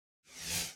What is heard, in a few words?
sparks mirrorcopy Row; netent